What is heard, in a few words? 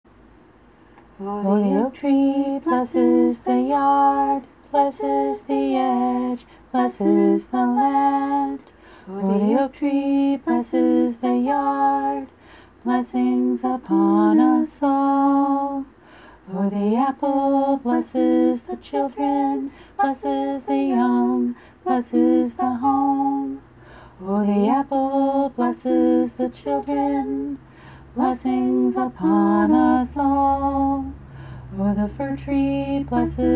kitchen blessing